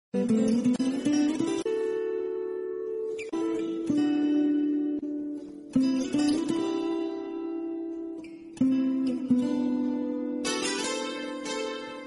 flute bgm telugu movie; teenmar

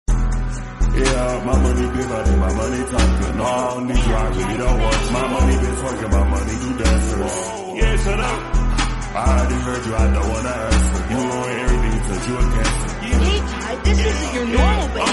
english instrumental

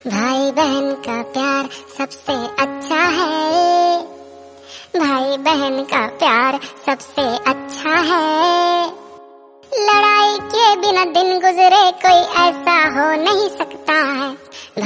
raksha bandhan hindi song

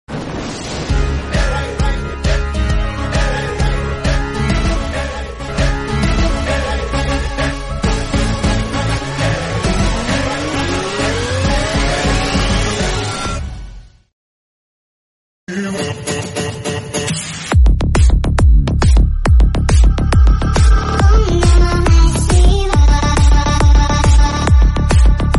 tamil bgm; boys

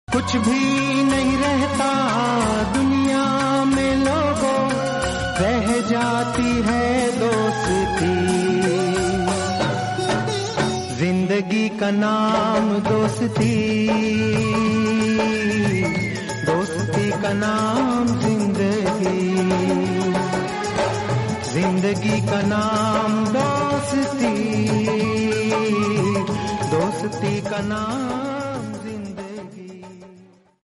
instrumental song

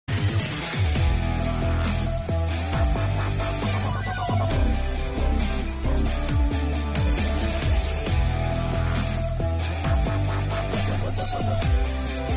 night drive climax bgm

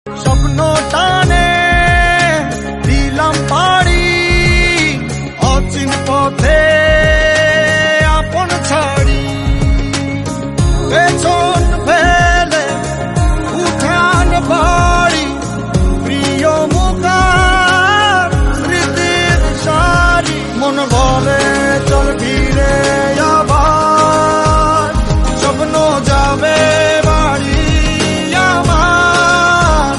emotional hindi

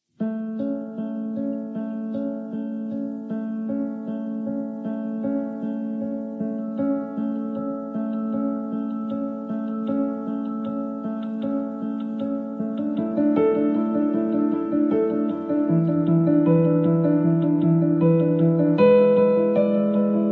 iphone early riser alarm

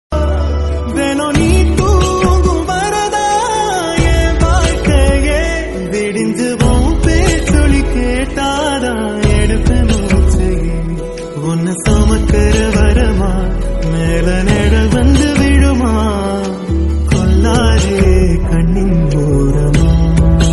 male version

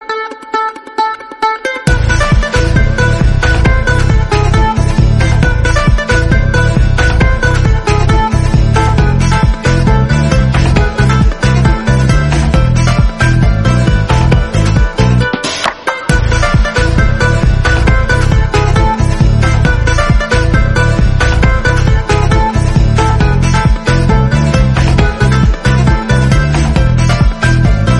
wedding party background score; vikram

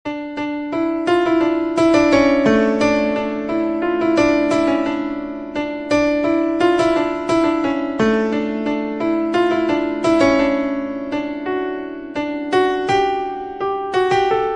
bgm; vaali

